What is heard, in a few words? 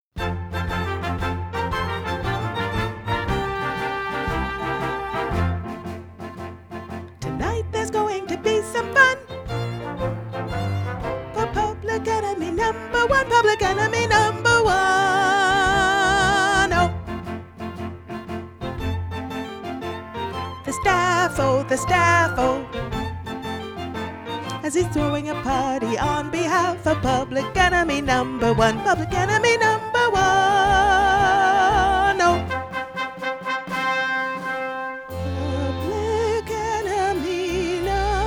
angels sop